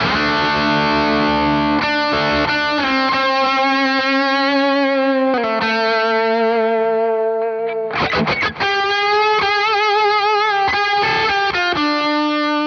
Nux MG-30 Plexi 100

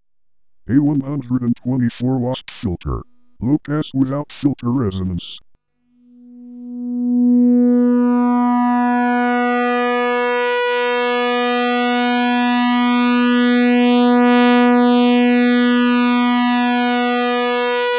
EDP Wasp LPF resonance

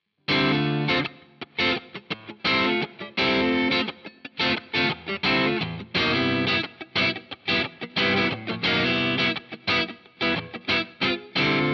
Headrush Core Cutting EL34

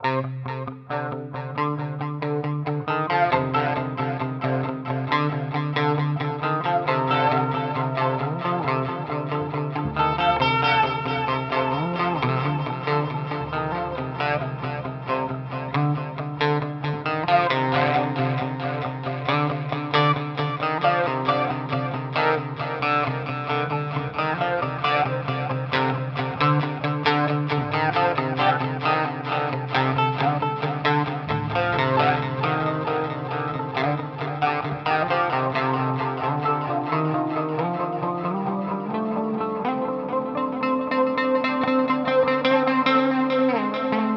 Harley Benton DNAfx GiT Flanger Phaser

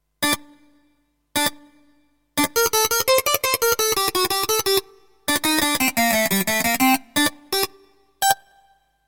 Harpsichord Release Key Off Trigger

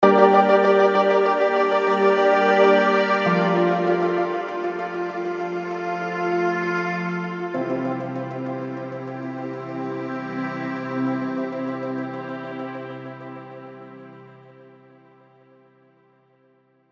Pads; Vocalise; Did I Stutter

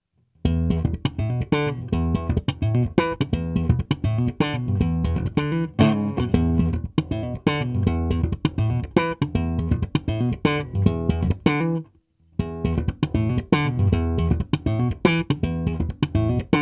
Preci Slap

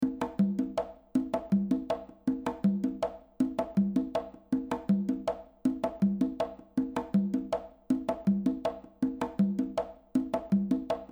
reverb loop Stereo Room; Eventide 2016; 18s

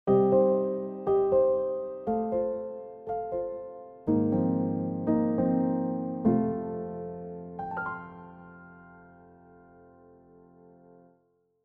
Yamaha Genos2 Felt Piano

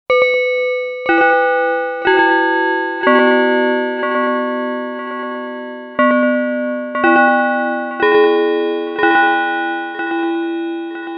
Sequential Pro 2 FM Bells

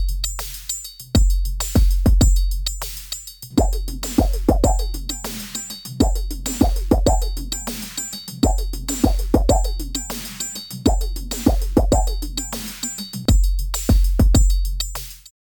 Elektron Analog Heat, Acid Line Beat

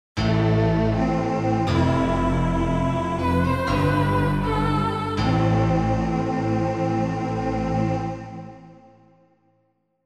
Korg M1 Chor Bells

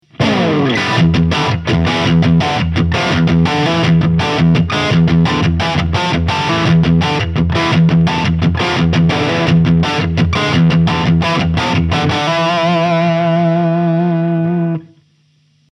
LP Marshall 2004 Crunch

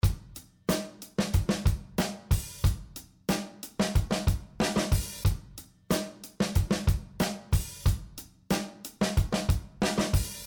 Master Loop

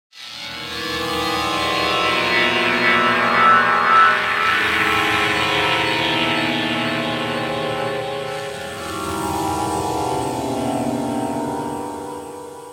metallic athmo

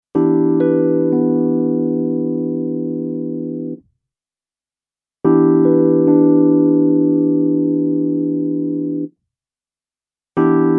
Nord Piano 5 Piano Timbre Soft Mid Bright; Dyno1; Dyno2

